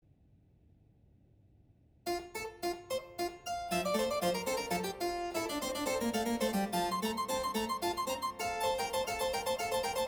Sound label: Claviorganum Inventio; Bach